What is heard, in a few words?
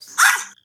bark; zeus